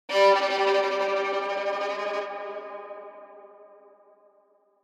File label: Fiddle Hit